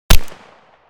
Beretta handgun single gunshot close

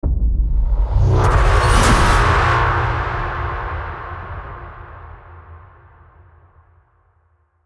Cinematic braam hit impact; transition

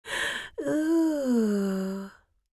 Old lady surprise moan

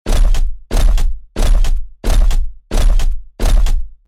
Robot juggernaut footsteps walking

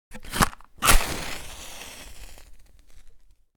Matches lighting with fire burning striking

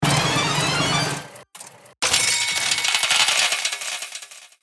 Slot machine casino big reveal reel start

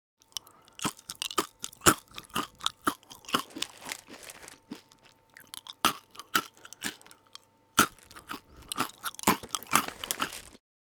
Eating potato chips mouth open crunchy

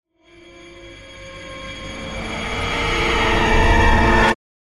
Granular madness riser

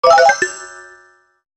Pop up reveal

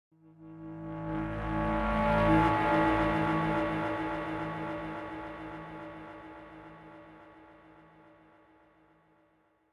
Horror accent sinister suspensful

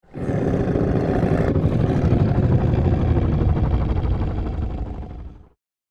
Large beast monster growling; fantasy

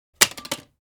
CD or DVD single drop hard surface

